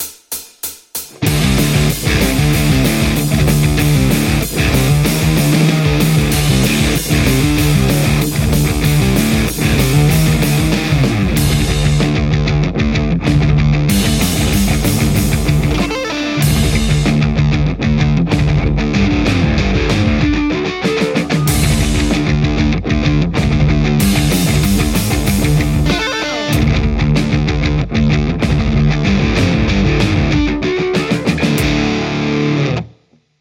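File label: metal Stratocaster; mercuriall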